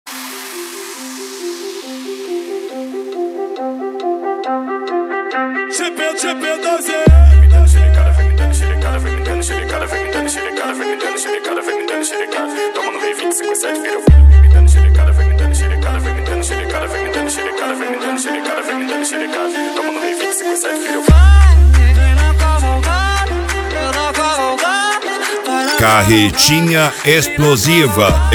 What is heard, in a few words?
mega bass